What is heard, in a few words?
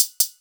V Noise Snare; 300 Drum Machines